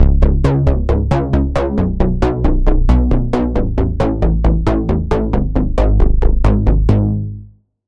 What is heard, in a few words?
Bass; Routed Sound; Spire Expansion - Reality Maker Vol.1